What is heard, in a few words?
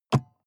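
sports car interior compartment close soft; verkehr transport; autos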